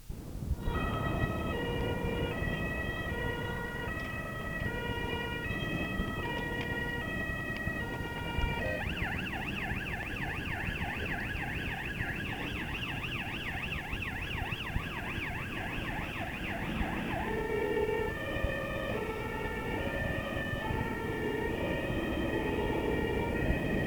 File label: sirens